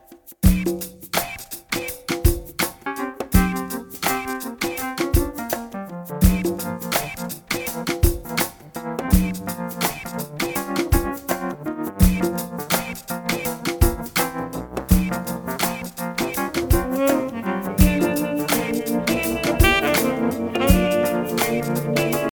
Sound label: wurlitzer